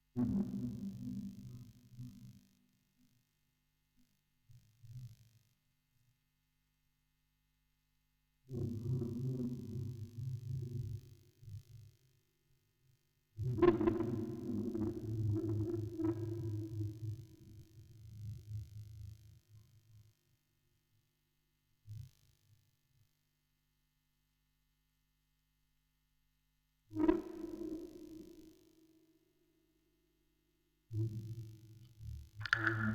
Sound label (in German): Aufnahme Vocoder, Spieluhren-Quartett